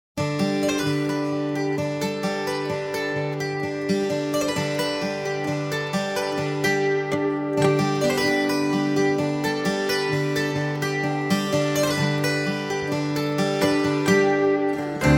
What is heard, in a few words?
25 strings